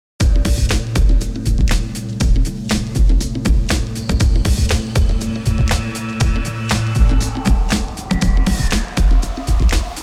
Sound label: percussion